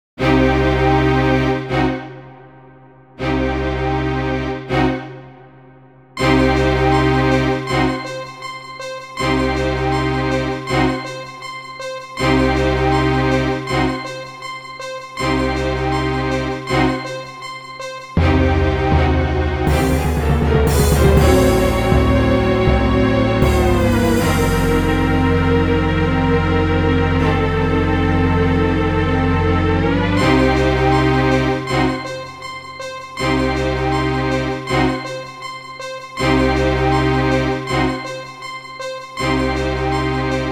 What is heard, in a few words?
RPG evil; Event